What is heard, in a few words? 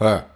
grunt